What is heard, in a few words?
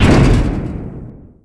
Cont Hit; PC Gamer IT CD 48; trickstyle; TSdemo